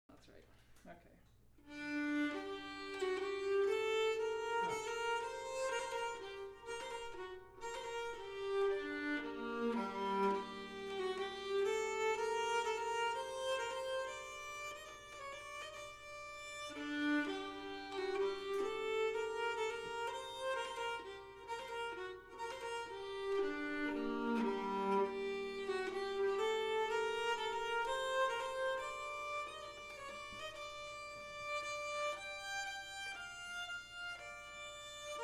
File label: nyckelharpa